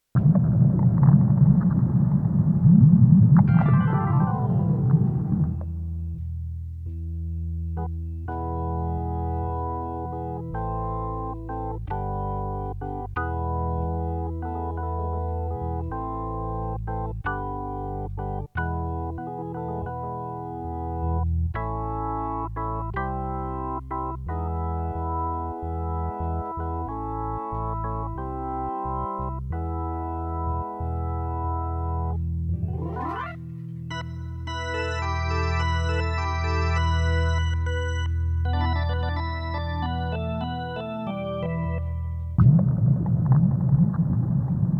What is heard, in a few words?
prelude